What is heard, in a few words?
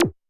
Big Blip, Alesis D4